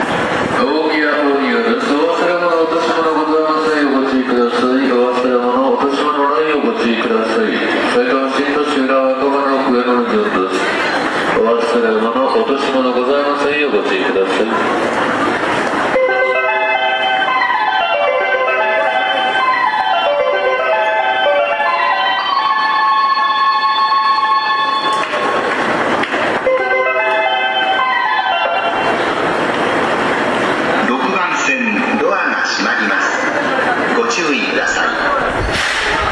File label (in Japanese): Water Crown, 浦和・上野方面